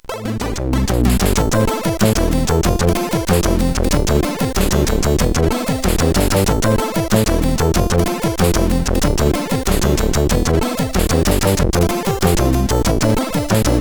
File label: intro conversion, CSG8580R5; sid; DSR-FLT